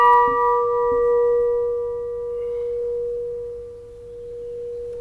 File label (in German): Glocke, Glockenspiel; Glocke 12